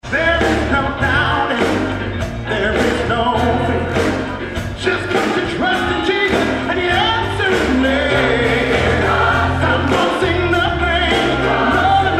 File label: Solo Choir Band